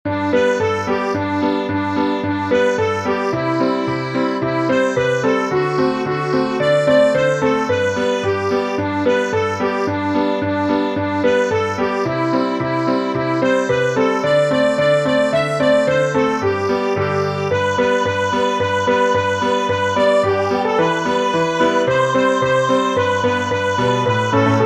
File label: Jingle bells